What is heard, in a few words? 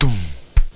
rubber